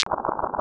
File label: combine button; buttons